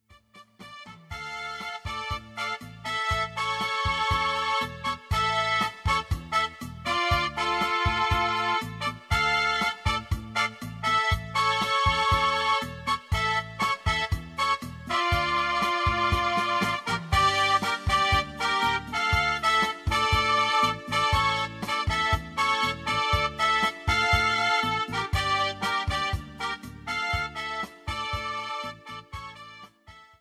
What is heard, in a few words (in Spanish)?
Cha cha cha